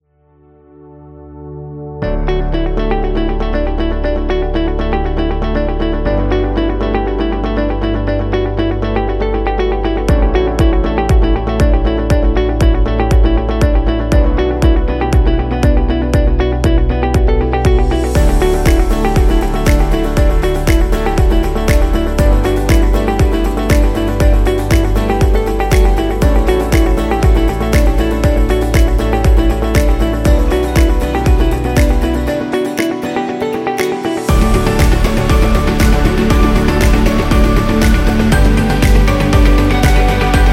Study

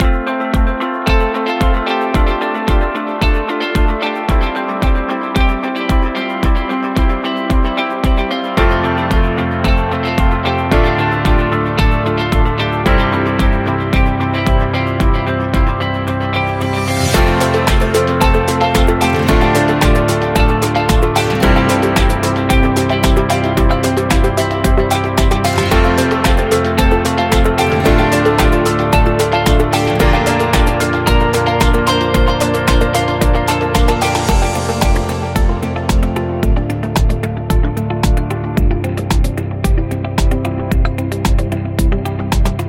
Positive Upbeat; corporate music vol 15

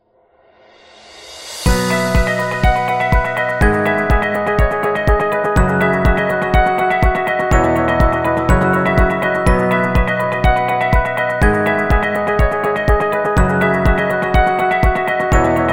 Happy Emotions, corporate music; corporate music vol 2

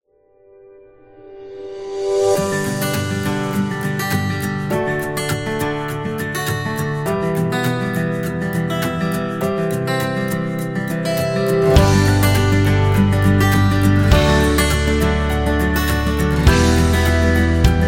Uplifting Acoustic Guitar; happy music vol 6

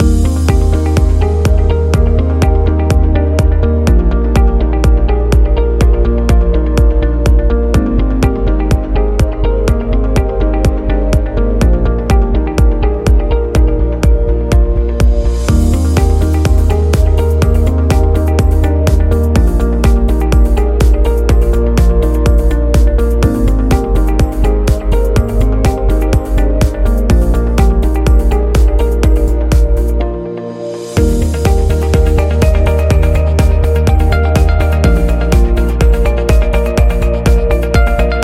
Corporate Loop

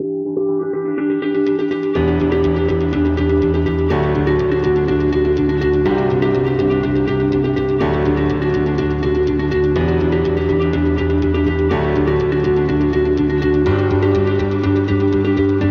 Corporate Rock

